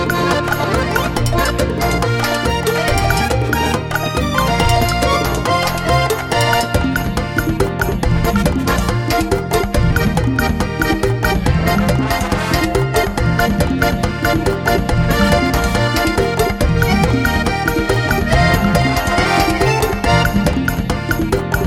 Cuarteto

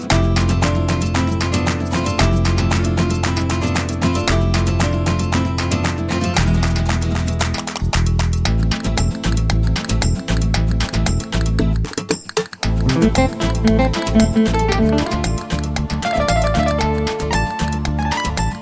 Gipsy Dance

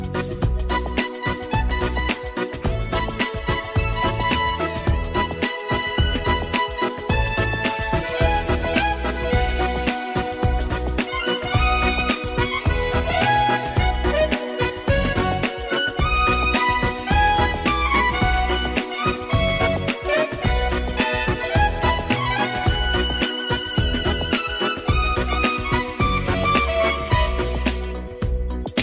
Unplugged Beat

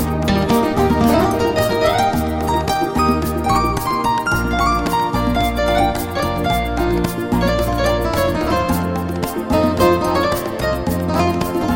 Rhumba

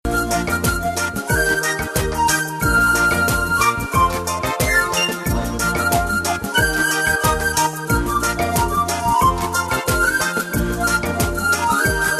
Limbo Happy

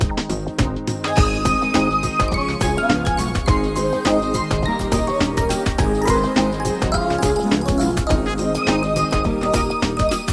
Funk

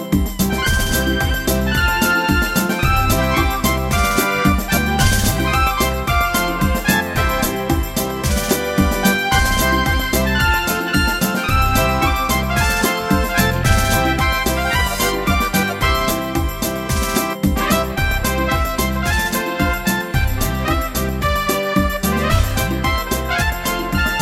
Norteno

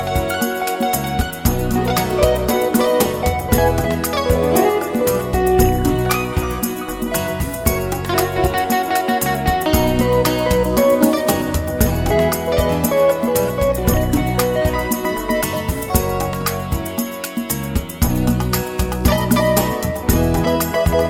Pop Beguine

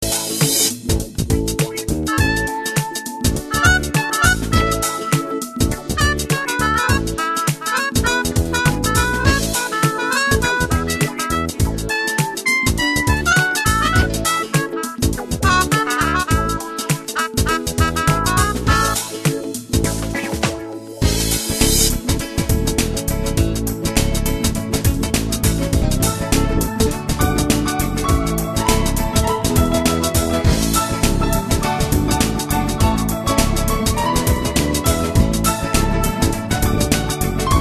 Funky

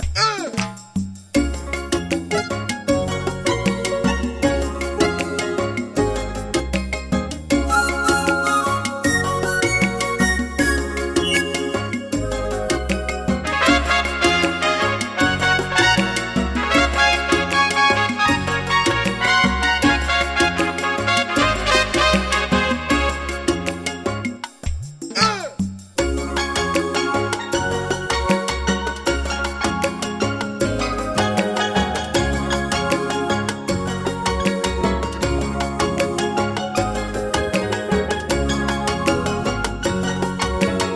Calypso

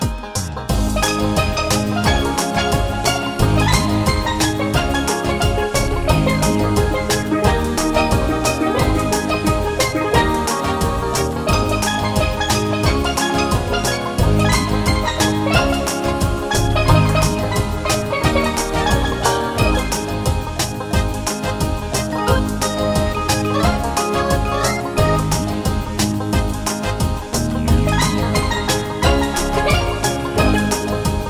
Limbo Easy

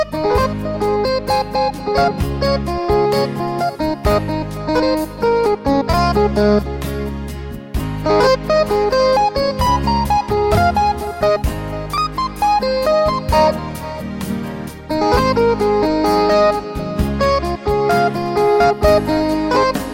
Guitar Crying

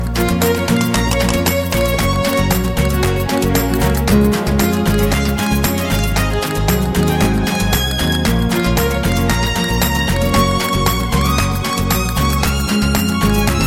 Gypsy Dance

